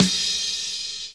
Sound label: KIT, Dance Mega Drums, HIP; Best Service